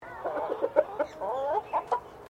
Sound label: Bird Chicken Berel Clucking; Birds from Collingwood Children's farm; Birds (Sound Effects:Animals)